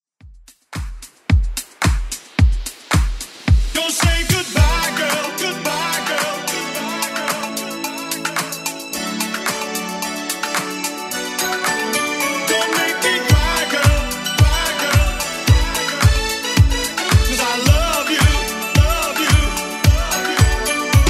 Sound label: 80s Club Edit 110bpm